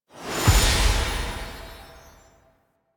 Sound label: NPC Interaction Open, UI; Warframe; Entrati